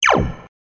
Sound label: Louder Squip sound